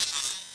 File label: Failed; interface